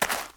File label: gravel, step